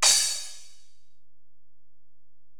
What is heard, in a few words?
Ride, Alesis DM-PRO, Drum Machines